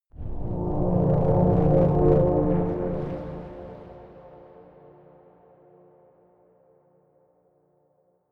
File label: bubbling rumble chord, drones